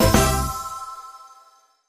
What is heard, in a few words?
gameshow chip minor